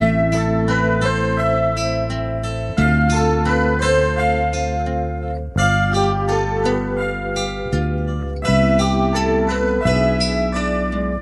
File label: guitar, Loops, Sad